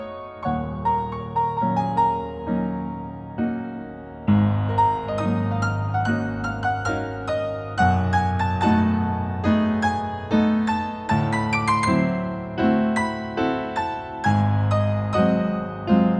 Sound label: Piano Calm; Layers of Fear